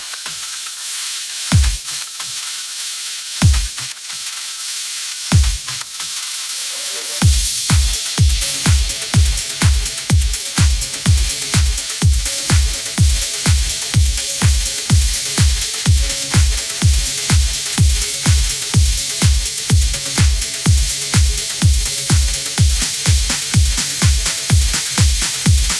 dance

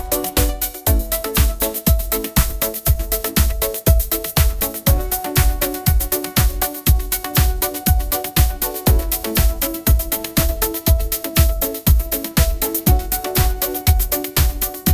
bright pop ringtone vibes; ai

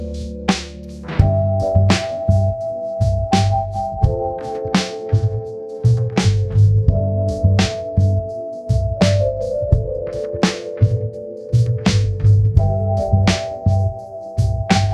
midnight jazz lounge grooves; ai

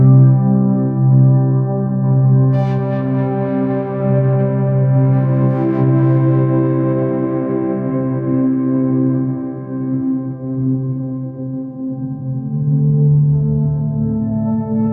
ethereal dreamscape ringtone; ai

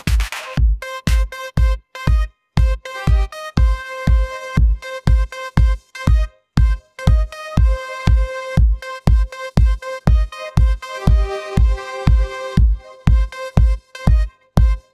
pop iphone remix, ringtones; ai-generated

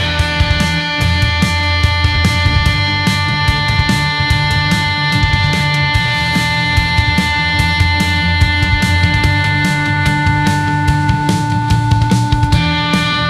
epic rock anthem; ai

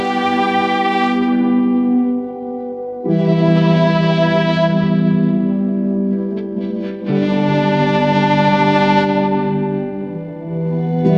elegant cinematic strings and piano, ringtones

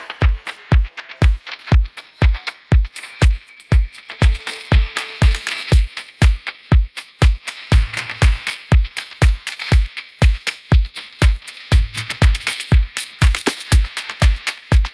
bright synth pop groove, ringtones

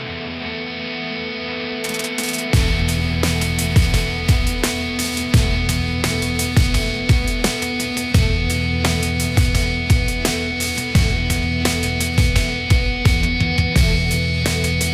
epic rising rock anthem

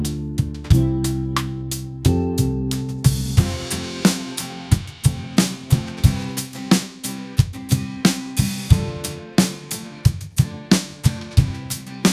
pop ballad

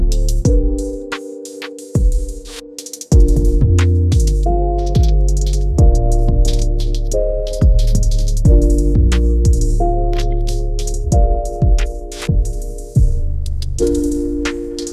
lofi beat; ai

